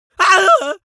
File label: cartoon vocal goofy male really afraid scream